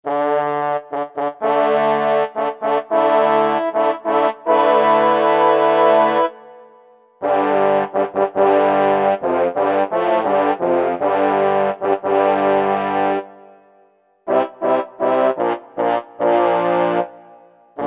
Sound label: FR.HORN; Orchestral Winds; Roland SN-U110-06